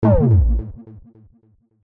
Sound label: Enemy Hit